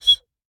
Bird whistle, clay, painted, dry, airy, wispy, single, burst, sharp, light; Eiravaein Works; Vaeyan IV